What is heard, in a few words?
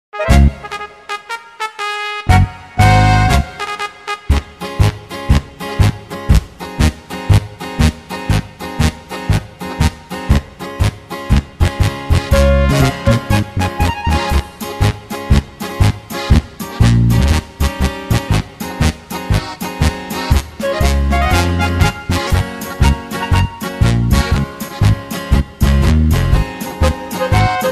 Tiroler Polka, KORG Styles